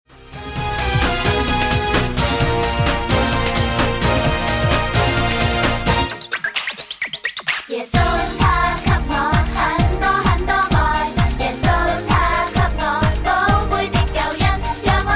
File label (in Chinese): Karaoke, 兒童詩歌